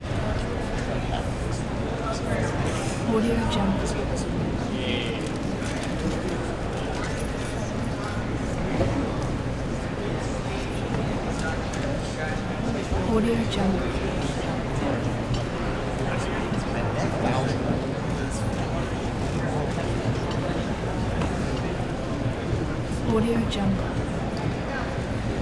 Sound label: crowds theater theatre 500 people idle muted subtle; آمبیانس جمعیت در سالن; human sounds